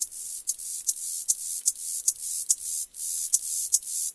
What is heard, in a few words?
crik, forest; ambient; source engine; ep2